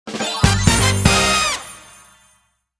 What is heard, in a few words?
bonus Sign; scruffy duck